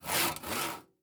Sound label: construction saw